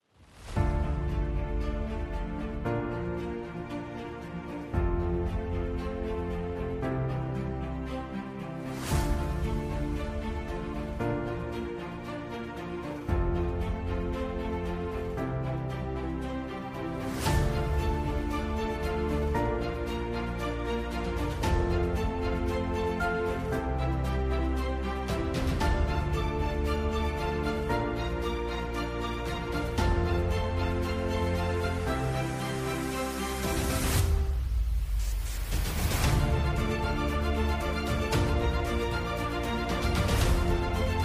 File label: bgm